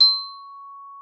TRIS C#6, VIBES; Xsample VOL-4

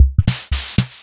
Fricke MFB 502 Kit

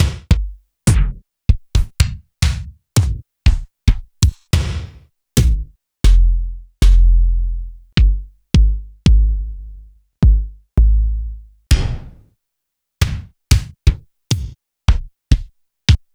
Roland R-70 BD

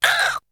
Beatbox; ZERO G - Datafile DISC 3

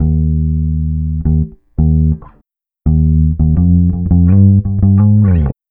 Bass, Trip-Hop Loops; Sonic Foundry (Sony Creative Software); Rondo Brothers Trip-Hop Loops & Samples; Hard Rocks The Roof

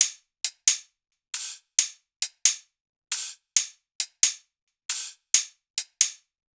loop hat 135; Seismic Loops

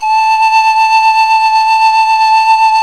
SIKU VIB A3; The Andes

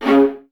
String Ensembles, Violas

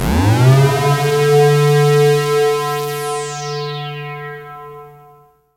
Lucky glide; Keith Emerson Signature Series

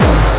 GARBAGE CLP, Drums; Distored Reality 1&2

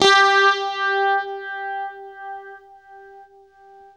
UNIVIB G 3, Guitar; East West; Steve Stevens Guitar Samples Collection

